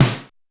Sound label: Roland U220 Electric Set, Drum Machines